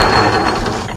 minigun spindown; scmod; ut99

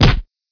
body drop; darkfuture

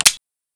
357 cock

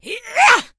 attack, elven female

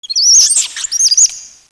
rat alert

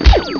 lazer

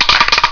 can shake

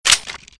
slide release, 9mm handgun

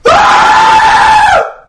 b scream close

